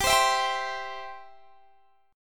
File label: C Major G